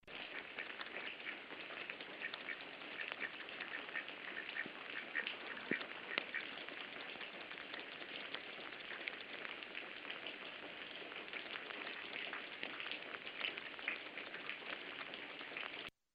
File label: Duck Waddle